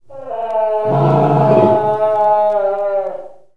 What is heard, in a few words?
lion moan; KABOOM! - Wild and Wacky Sound Software; animals cats